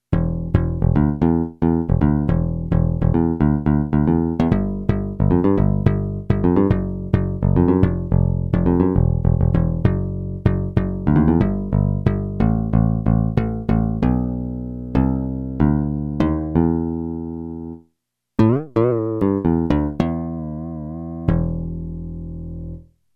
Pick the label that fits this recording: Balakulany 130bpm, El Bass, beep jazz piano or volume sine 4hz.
El Bass